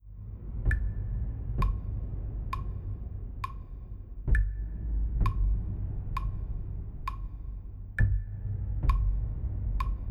backing track with metronome L channel metronome R channel